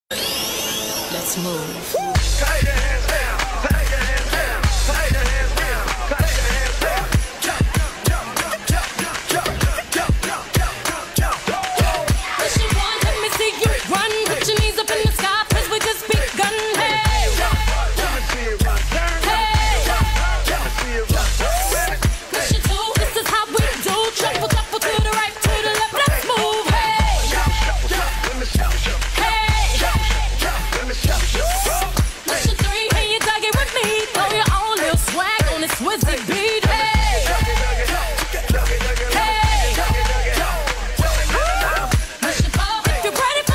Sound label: Jazz; Gold Jazz